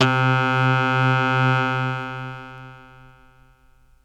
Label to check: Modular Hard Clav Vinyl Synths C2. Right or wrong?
Right